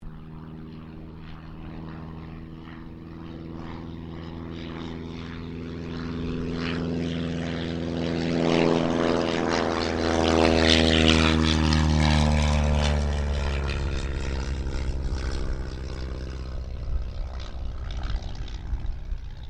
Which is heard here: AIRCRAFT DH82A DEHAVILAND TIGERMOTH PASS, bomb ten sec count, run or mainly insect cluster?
AIRCRAFT DH82A DEHAVILAND TIGERMOTH PASS